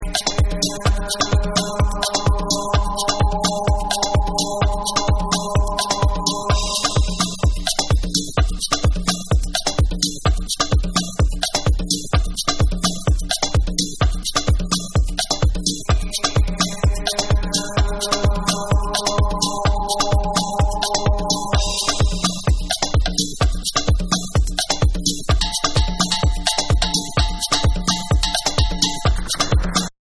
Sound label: Beatz